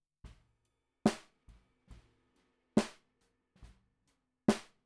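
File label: Snare Bottom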